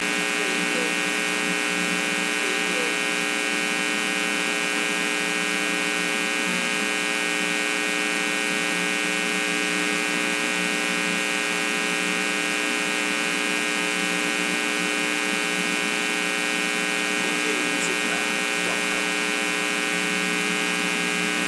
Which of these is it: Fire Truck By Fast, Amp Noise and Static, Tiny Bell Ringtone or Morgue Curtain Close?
Amp Noise and Static